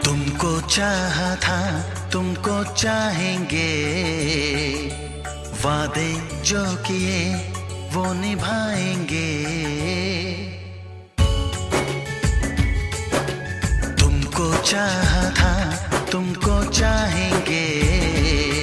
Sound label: Song Ringtone